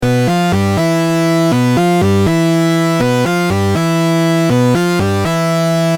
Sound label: mks50 lead dry